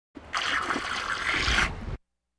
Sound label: Animal effects snorts grunts farm ambience